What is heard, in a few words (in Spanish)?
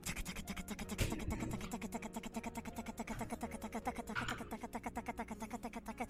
tacatacataca helicoptero